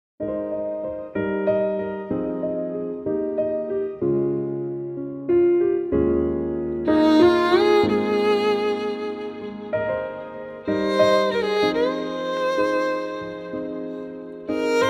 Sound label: Violin Cover